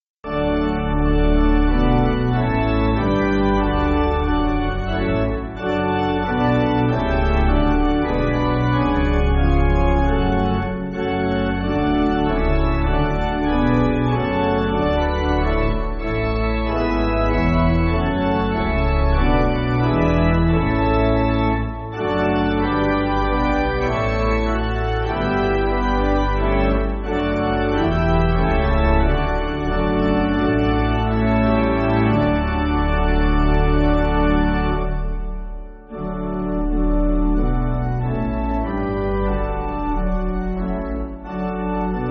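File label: Pipe Organ, Free Music Backing Files for Churches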